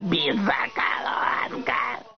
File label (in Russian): Бензоколонка